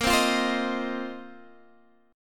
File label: A sharp Suspended 2nd Suspended 4th A sharp down Guitar Half Step Down